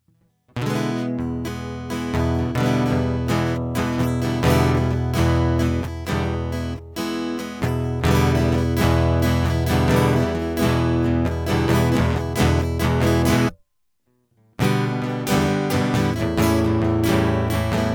Instrumental; hyper terrestrial

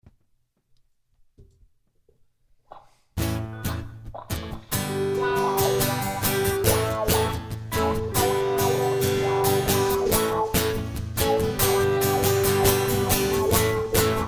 Instrumental; hyper terrestrial

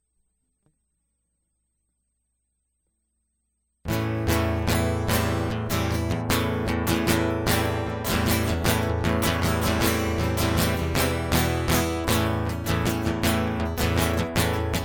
Grant Granted Guitar Loop; hyper terrestrial

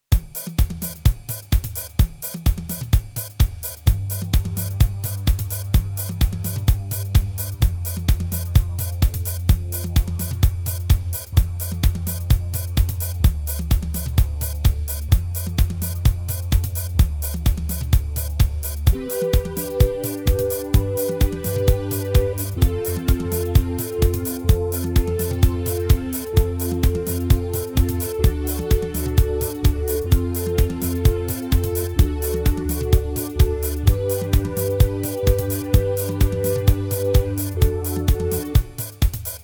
Prelude

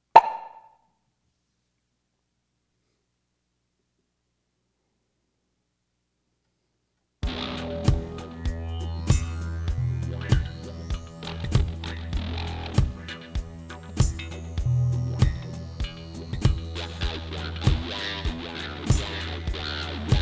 Bubbling Prelude

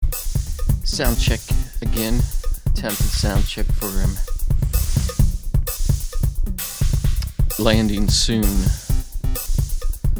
Soundcheck; Chill While Possible; Landing Soon

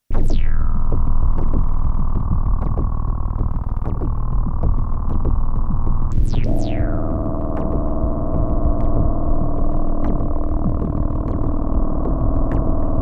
Eb EDM; hyper terrestrial

